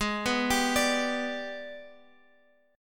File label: Minor Major 7th Flat 5th, Guitar, Open G; Gsharp; 18,16,17,19,x,x